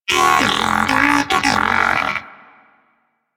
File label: Moa Pet Battle End; Warframe; Pets; Tenacious